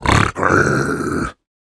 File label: piglin angry; Minecraft